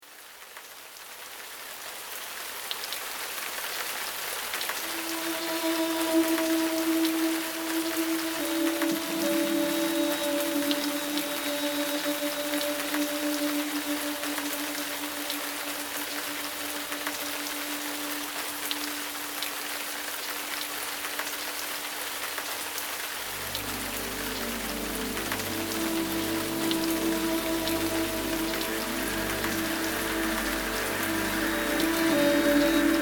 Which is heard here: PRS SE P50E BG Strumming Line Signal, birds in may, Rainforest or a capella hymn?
Rainforest